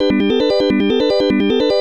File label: Arcade ~ Power Up; pepen ga pengo genesis